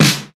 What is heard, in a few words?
raw steel snare drum sound d key, snare single hit tuned to the D note; snare drum samples